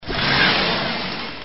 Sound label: push